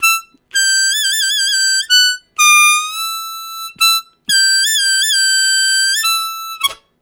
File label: Highest, Harmonica; Zero G